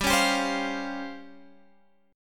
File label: Db Major 7th Flat 5th, G, guitar